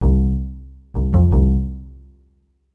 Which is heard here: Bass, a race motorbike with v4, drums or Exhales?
Bass